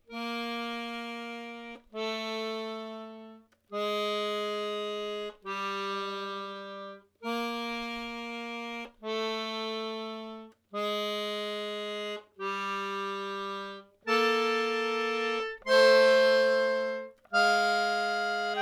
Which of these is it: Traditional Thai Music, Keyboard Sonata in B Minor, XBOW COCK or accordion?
accordion